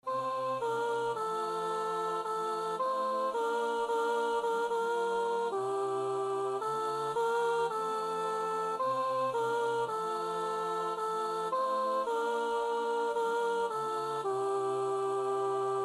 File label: Chorus Descant